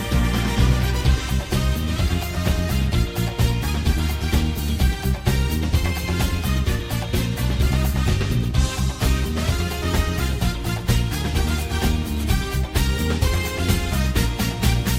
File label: Instrumental